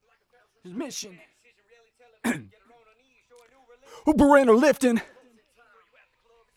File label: Hook